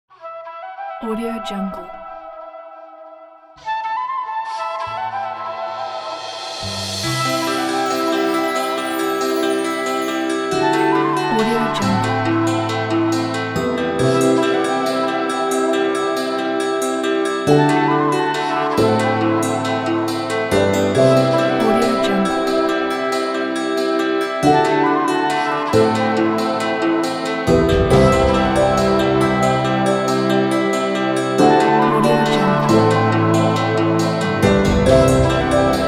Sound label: ukraine independence ethnic cinematic trailer